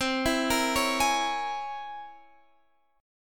C 7th Sharp 5th Flat 9th C

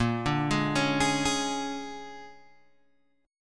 A sharp 7th Sharp 9th A sharp down Guitar Open G

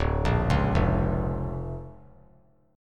D Minor Major 7th Sharp 5th, D; 2,5,4,3; DmM7#5 arpeggiated; JGuitar

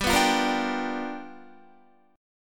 G 7th Sharp 9th G, Guitar; G7#9 strummed; JGuitar

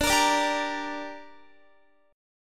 D Suspended 4th Sharp 5th D down Guitar, chord; strummed

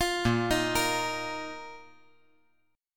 Bb Suspended 4th Bb down, chord; Bbsus4 arpeggiated

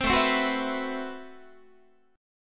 A Minor 6th Add 9th, Guitar; Am6add9 strummed; 15,17,15,16,15,15; 4A,4E,5A,5D,5G#,6C#

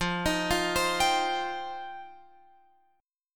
F 7th Suspended 2nd F down Guitar Open F; F7sus2; arpeggiated

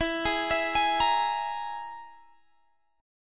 G sharp Suspended 2nd Sharp 5th E, guitar

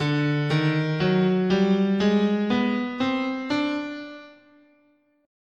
D Oriental, guitar, scale

